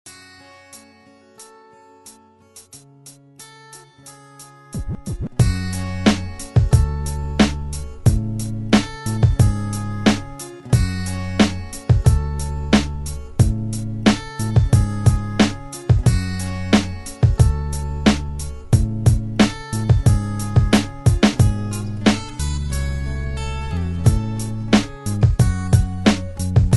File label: Beats